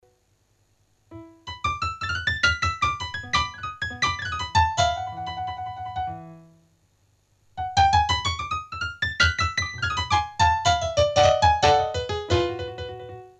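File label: electric piano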